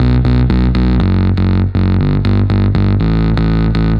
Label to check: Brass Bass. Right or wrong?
Right